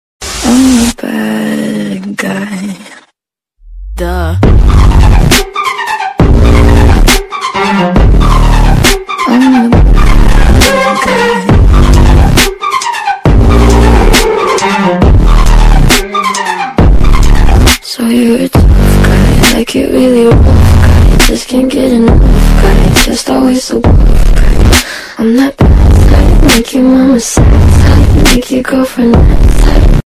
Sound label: ringtone on iphone xr